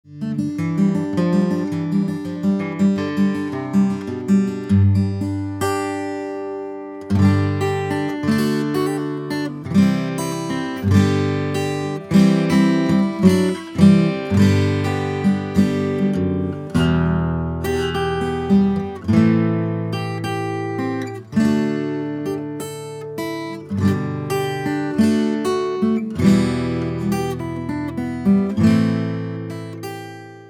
Westerngitarrenaufnahme

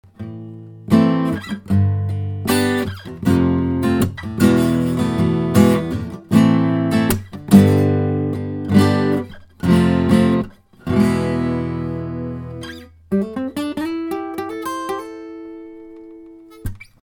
audience, westerngitarrenaufnahme

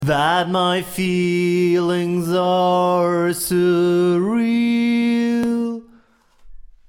glitch, Knacksen bei Aufnahme von Vocals

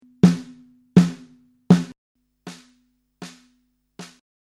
sn vergleich

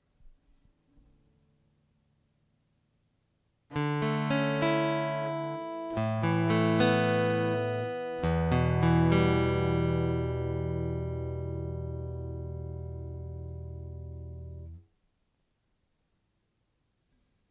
git probe

